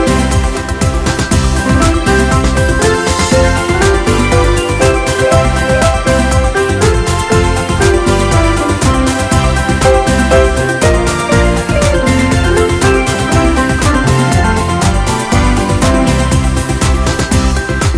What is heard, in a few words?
Lovely and Smooth Disco